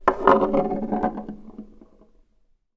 toy marble roll across wooden table

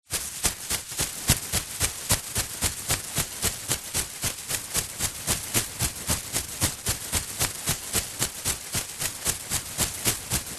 plastic carrier bag full running movements

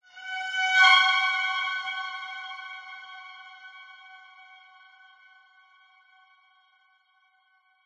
hit violin stab reverb shock jumpscare

